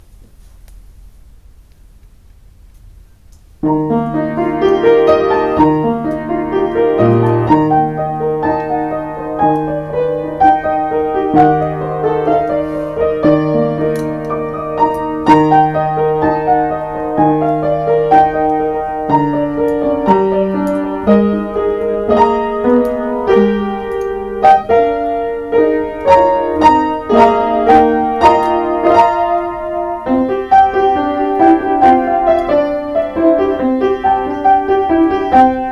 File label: piano